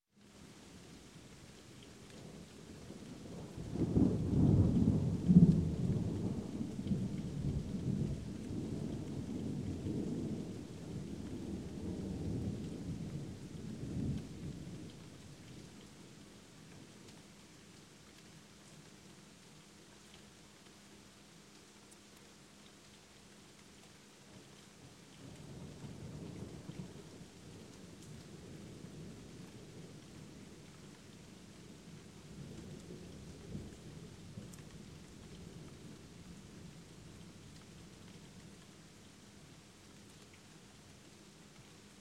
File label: Rain, Thunder, Light; Sound Ideas 2000 Series